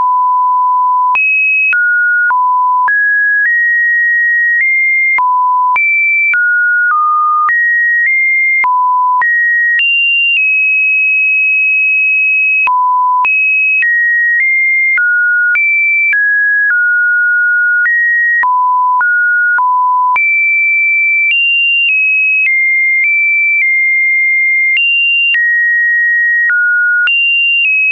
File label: JT9H; from WSJT-X with default 1500Hz tone setting